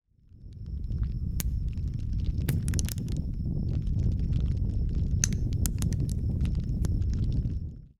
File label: fire